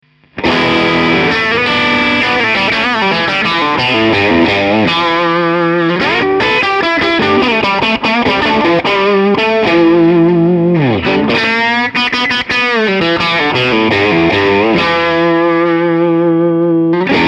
Engl Fireball 25 Clean Ch. cranked up Mid Boost on